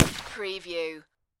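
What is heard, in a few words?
Pistol Ruger 357 Single Shot Exterior At 45ft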